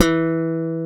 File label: Alembic Pop E3; Mo' Phatt; Digital Sound Factory Mo' Phatt